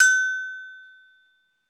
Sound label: Afr Agogo; Club-50 - Foundations Roland; Agogos; Fiji